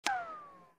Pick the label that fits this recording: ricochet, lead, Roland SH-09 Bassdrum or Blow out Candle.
ricochet